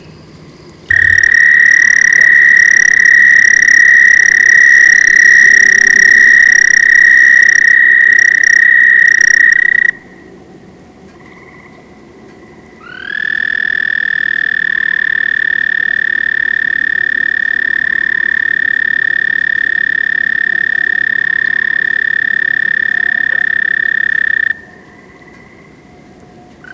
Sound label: american toad; amphibians; terrestrial